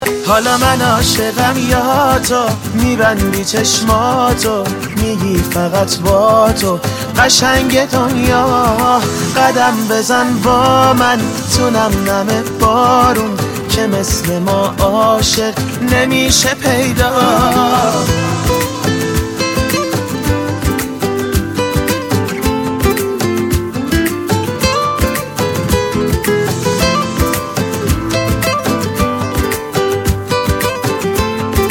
Mobile Ringtone

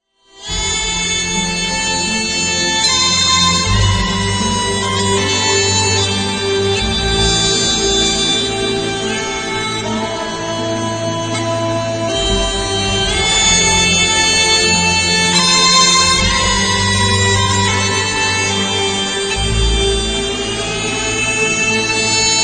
Ringtone; Braveheart

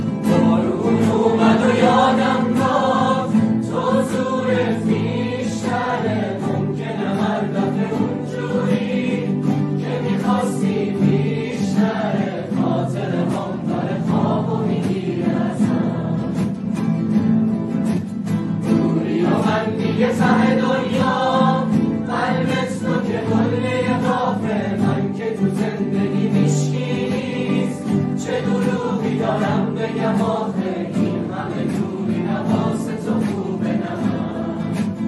guitar

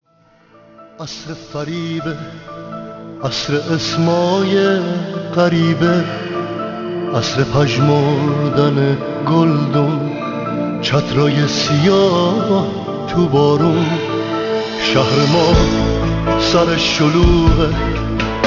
Mobile Ringtone

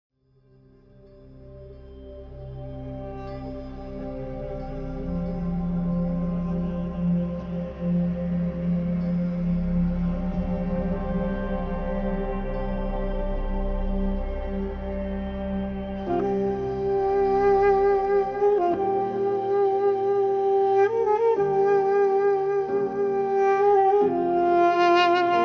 Mobile Ringtone

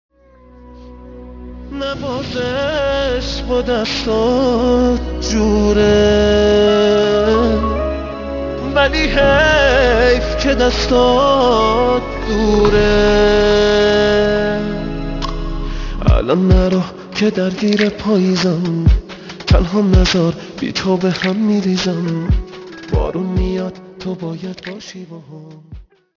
Mobile Ringtone

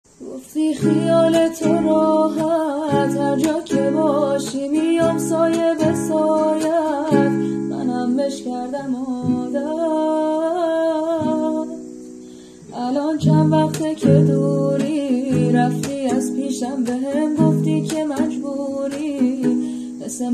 Guitar

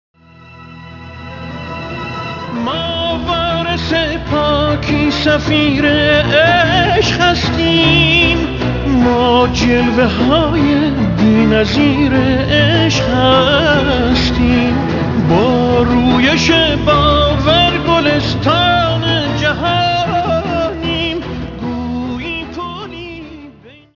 Mobile Ringtone

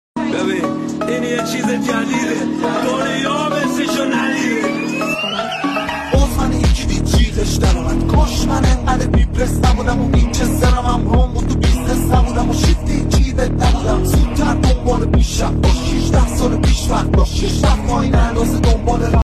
Rap Game Remix

